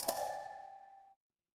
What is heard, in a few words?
ui click